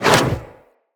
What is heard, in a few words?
Fire Arrow Impact; Level Events; Midrath; Warsong Prologue